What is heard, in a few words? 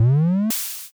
compressor